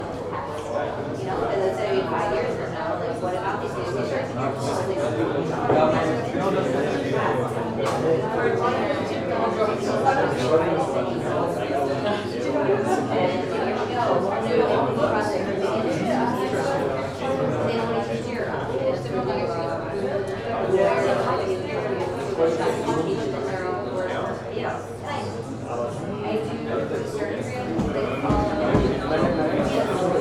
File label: Coworking Space Crowd Chatter Berlin